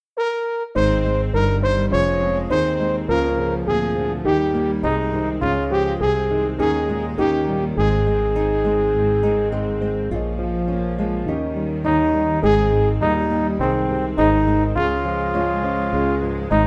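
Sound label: Music Backing Files for Churches; Rise Up O Men; Festal Song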